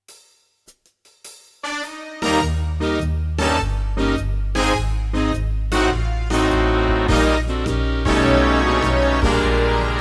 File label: C Bass Blues Eb